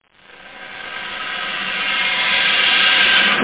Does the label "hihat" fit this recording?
Yes